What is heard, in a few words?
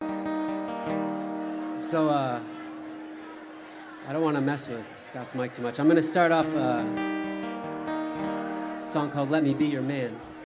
tuning